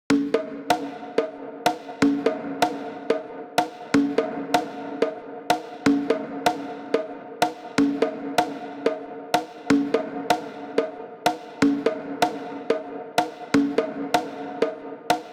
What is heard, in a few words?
Conga Gated Verb